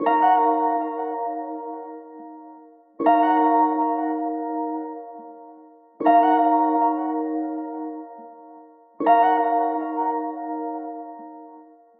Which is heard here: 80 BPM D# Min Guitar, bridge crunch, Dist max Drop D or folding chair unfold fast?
80 BPM D# Min Guitar